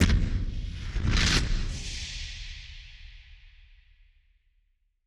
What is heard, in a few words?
Hit Splosion; musicradar; bomb factory